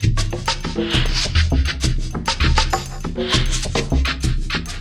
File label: 120 MAAR LP 1, Industrial Loops